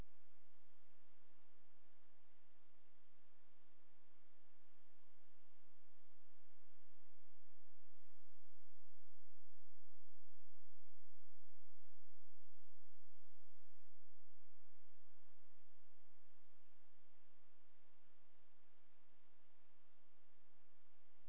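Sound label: hydrophone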